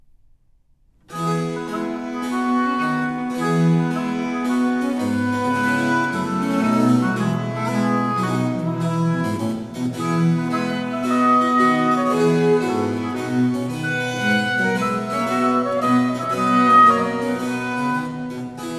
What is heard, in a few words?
Water Music Suite in F Major Aire; Handel